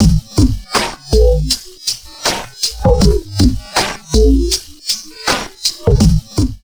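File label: drum loops crazy processed, 80 bpm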